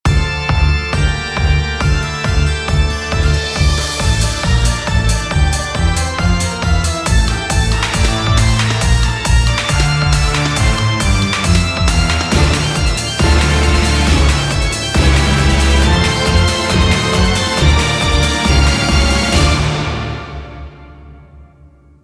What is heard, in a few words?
Continue; blazblue chronophantasma extend